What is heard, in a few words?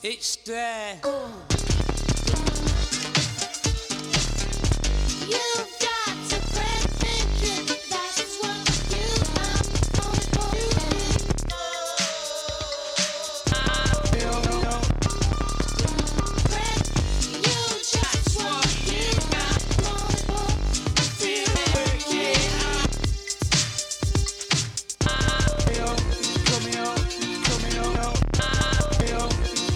Grace Digital AVPUSB01S